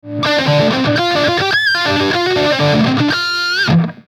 BB3 boost OD, Marshall Guitar Effects Pedals

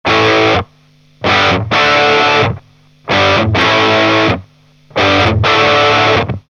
Rat2 Marshallish, Guitar Effects Pedals; Pro Co

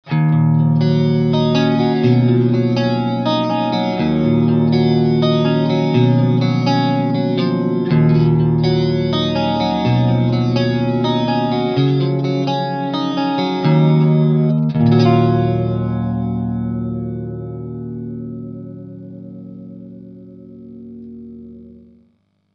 Chorus CF7 Normal, Ibanez Guitar Effects Pedals

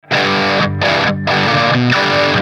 BB1 blues, Marshall Guitar Effects Pedals

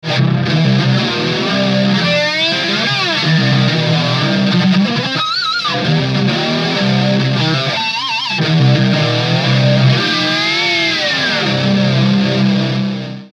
JH2 dist, Marshall Guitar Effects Pedals

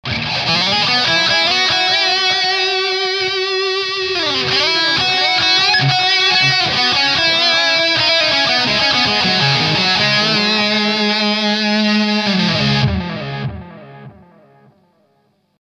Delay DE7 Solo, Ibanez Guitar Effects Pedals

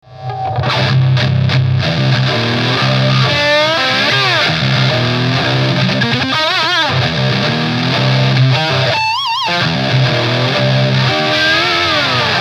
ED+JH2 dist, Marshall Guitar Effects Pedals

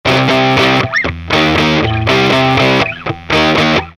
Turbo Easy Rock Rhythm, Guitar Effects Pedals; Pro Co

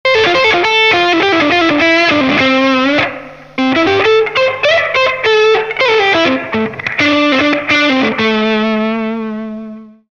Turbo Rock Solo, Guitar Effects Pedals; Pro Co

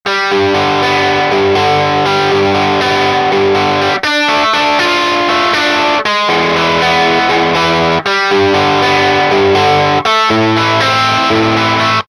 Solo Dirty Arp Comp Melt, Guitar Effects Pedals; Pro Co

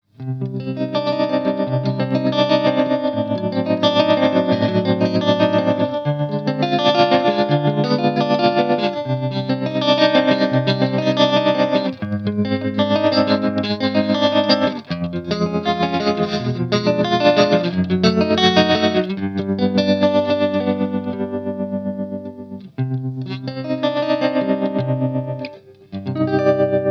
VT trem, Marshall; Guitar Effects Pedals

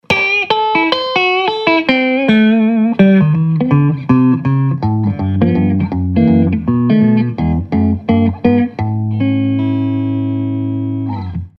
Bridge + neck clean, Greco LP Standard; Greco 1979 LP Standard Cherry Sunburst

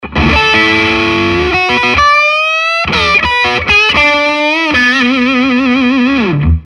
Bridge + neck distortion, Greco LP Standard; Greco 1979 LP Standard Cherry Sunburst

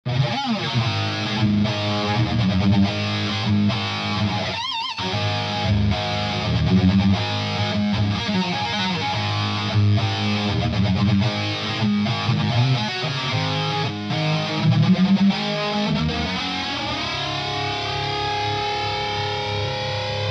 Flange CF7, Ibanez Guitar Effects Pedals

